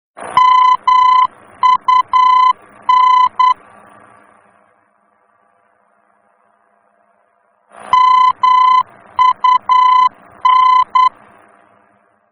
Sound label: VOR, aerobeacons; germany